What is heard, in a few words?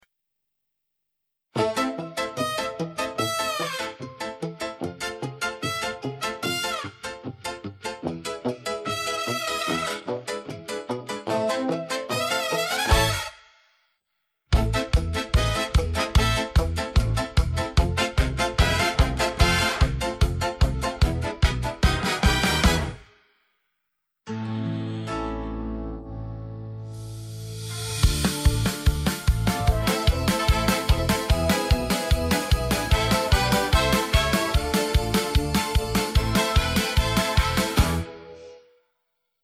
Instrumental; Hatter